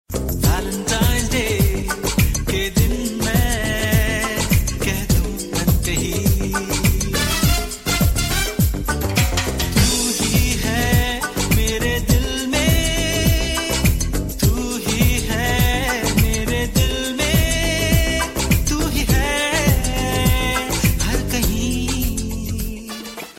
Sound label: valentines day jazz ringtone